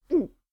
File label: Gulp